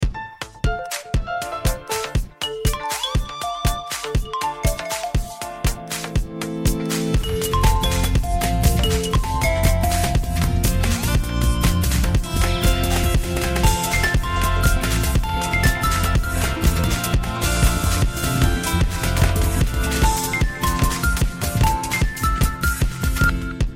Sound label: Oppo F21 Pro Ringtone